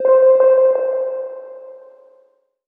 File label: KEYS Sprung (C), One Shots; Cymatics; Mystery Pack Vol 4